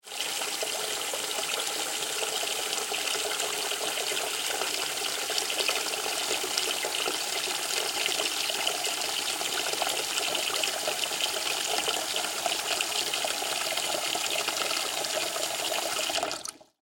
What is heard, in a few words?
Water Bath Tub Fill With Water; Nature